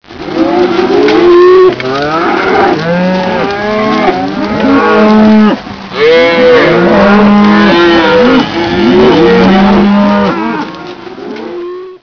cowses

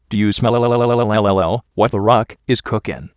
Rock

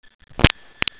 fart

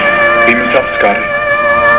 beam up

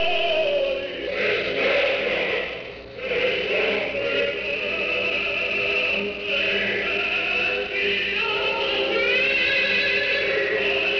tenor

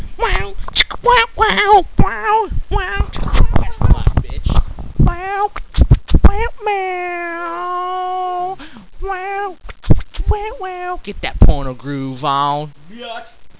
groove

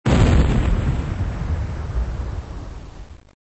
explosion

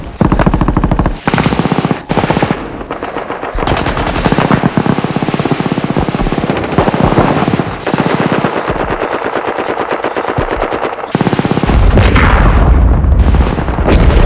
ambience; day of defeat

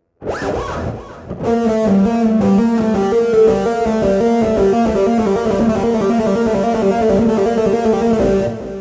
legato

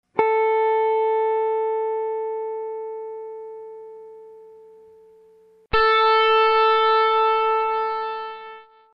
guitar note plus sqr

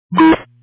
radio tone

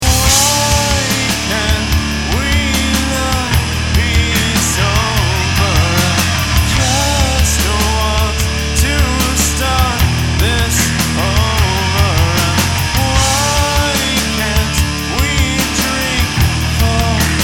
Sober Loop

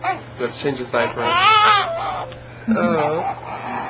cry

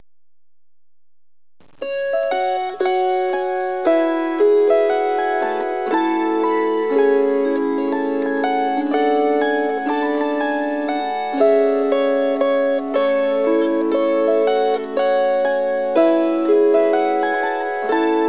harp

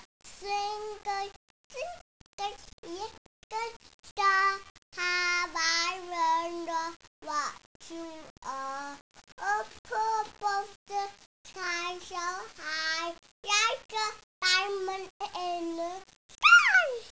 twinkle

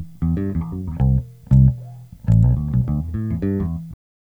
BASS

